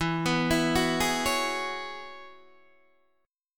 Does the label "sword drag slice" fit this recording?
No